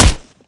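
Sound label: wrench hit body; weapons; eqclassic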